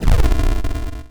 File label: burn